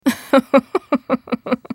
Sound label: laugh; Karma; Character Voices - English